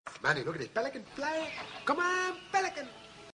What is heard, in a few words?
pelican fly